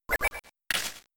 Soft Boiled

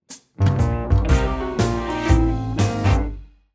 Jazz

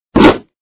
sound bump; Monster Arena

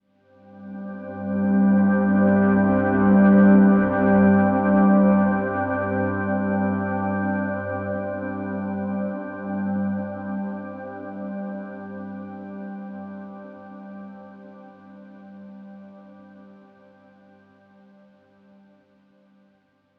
Chord Blur; inside

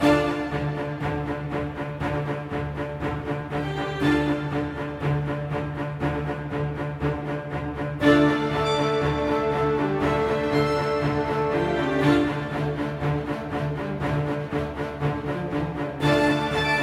Flaming Castle

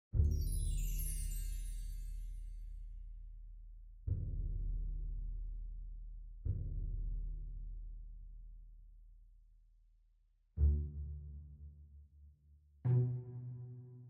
Keyboard tutorial, piano; Piano app by Yokee

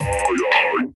BGM Disappear; Game Builder Garage

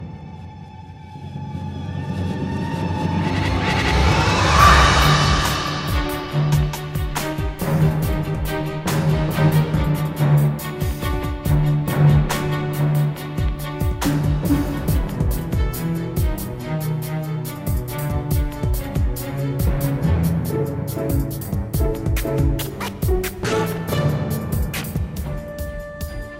Instrumental Part Two; True Crime New York City; Xbox

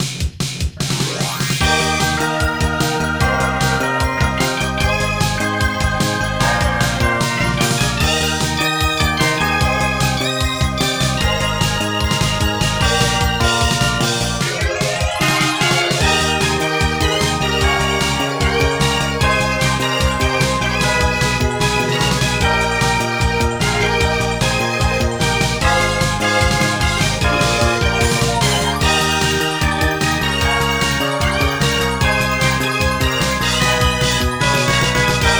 BGM; puyo pop fever nds

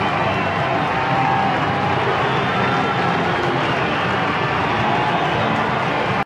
Pre Arena Ambience; Angry Birds 2

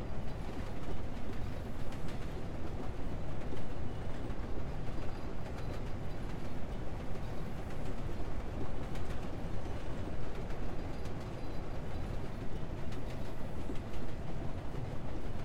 steam train onboard loop stereo

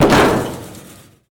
Hit Metal

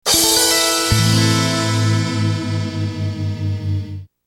finish - lose; southern island

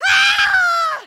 Female Death Scream

